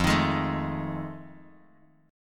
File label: F 7th Sharp 9th, Bass Standard; F7#9 strummed